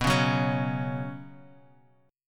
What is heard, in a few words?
B Suspended 2nd, Bsus2 chord; strummed; JGuitar